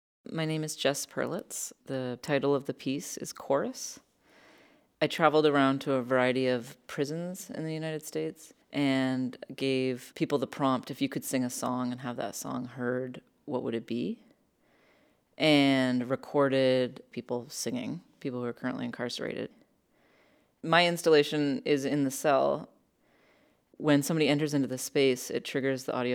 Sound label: Chorus